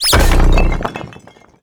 Character Attack

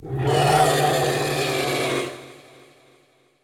Pigfury; super mombo quest

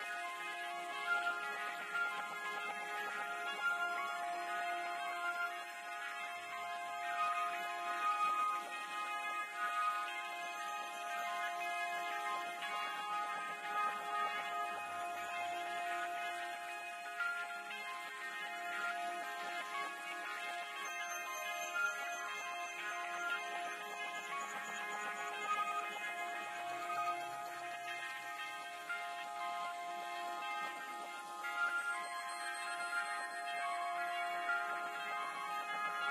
Light music loop

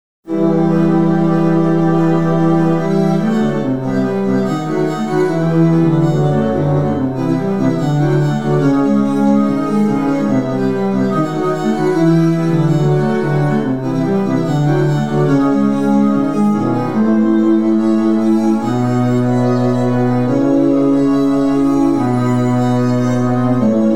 String Ensemble (Sextet); ルーネイトエルフ

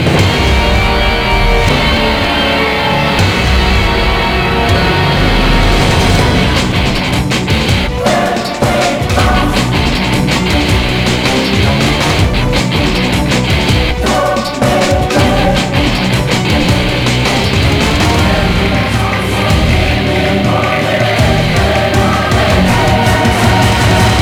Final Boss Battle; Eternal Legacy; Android

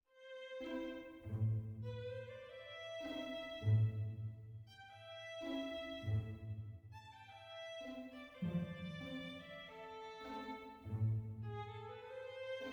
mysterious soft

